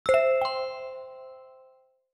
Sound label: call invitation audio